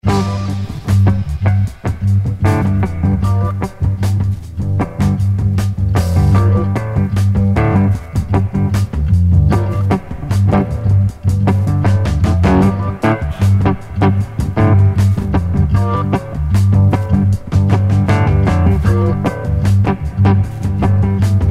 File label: JAZZ Funk